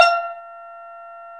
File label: Steel Drum D1